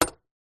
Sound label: household padlock metal med heavy set down on solid wood surface